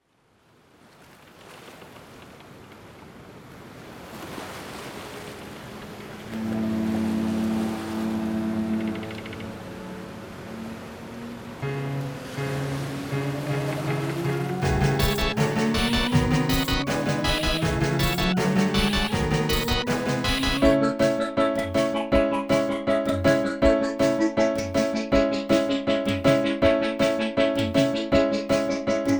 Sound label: Backing; Lemaoke; Stems